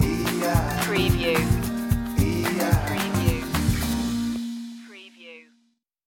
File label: ride along stinger